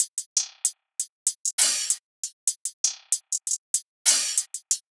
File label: Hihat Loop 97 BPM, Experimental; Banksy